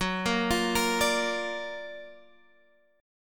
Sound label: F sharp Augmented Major 7th, Guitar Half Step Down